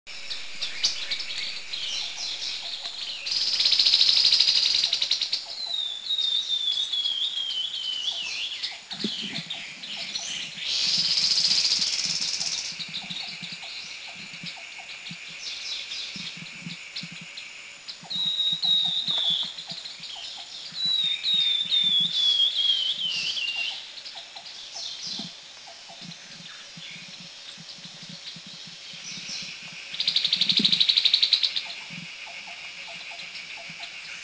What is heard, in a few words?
Grey-headed Babbler; Stachyris poliocephala; Thailand; Krung Ching